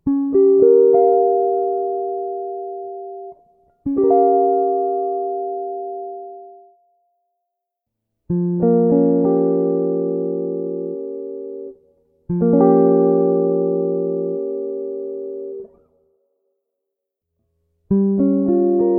Inversões de C7sus4b9

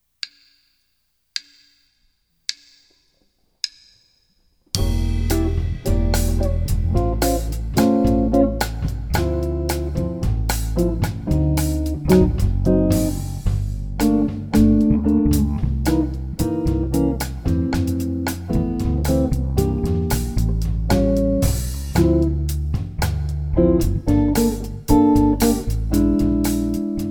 Bossa

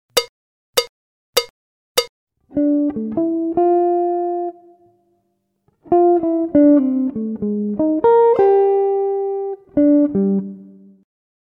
Melodia original Swing; Jazz Harmonia

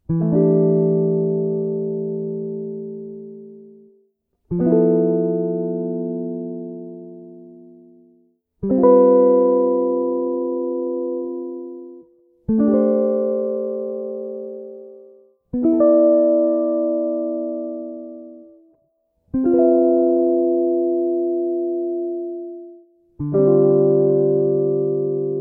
Harmonia Quartal; Jazz Harmonia

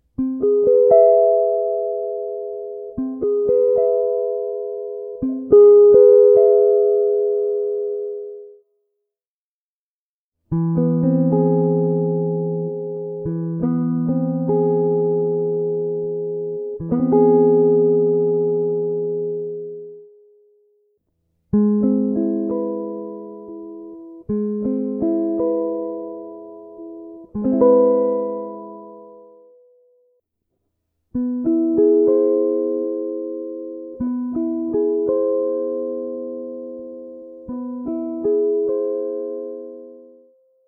Inversões de CMA75

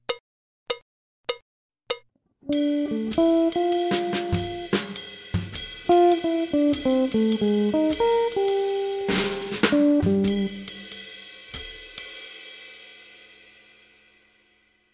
Improvisando preparacoes livremente; Jazz Harmonia

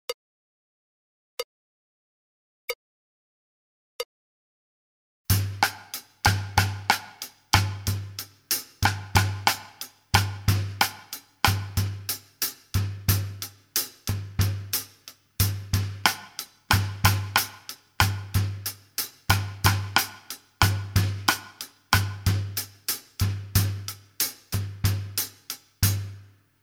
Execucao ritmica da melodia sobre ostinato; Jazz Harmonia

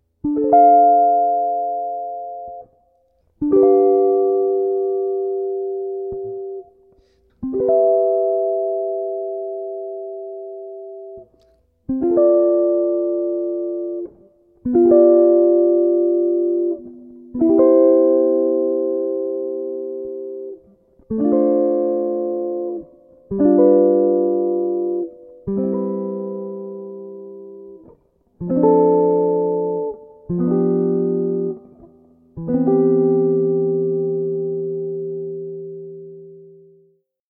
Conducao de Vozes; Jazz Harmonia

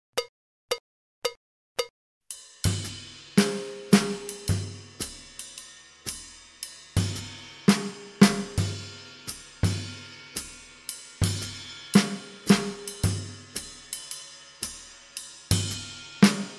Bossa Nova; Jazz Harmonia

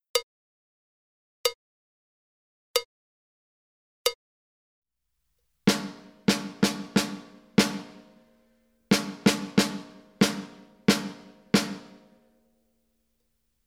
Execucao ritmica de melodia; Jazz Harmonia

